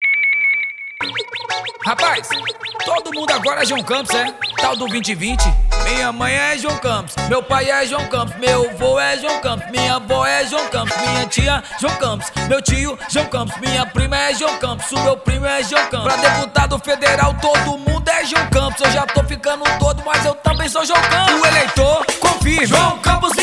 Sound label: jingle